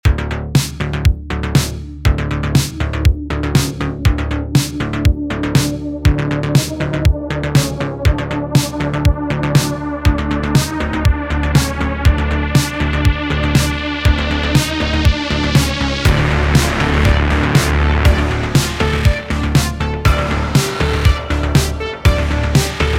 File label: Fight Theme Music; robothorium